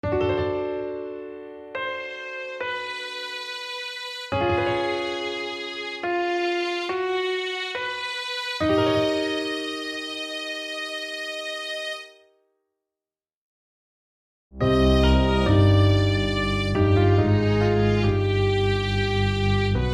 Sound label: Mixed And Cello